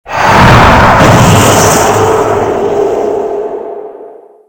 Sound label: death and decay; csgo; wcs go